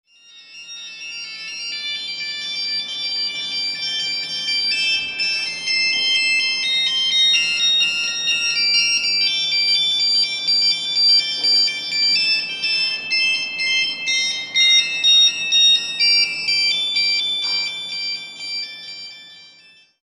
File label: dial tones; telesymphony